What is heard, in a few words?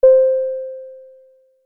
Elevator Ding; ld25